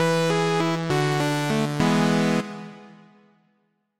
game over

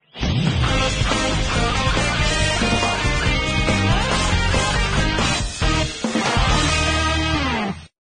victory; DDTank (Gunny)

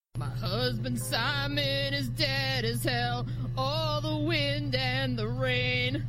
wind and rain

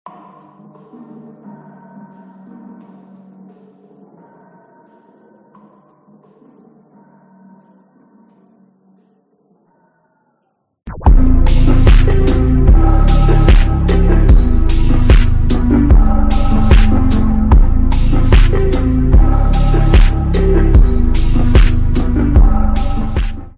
reverb slowed faded

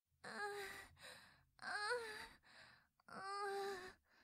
cute anime moan

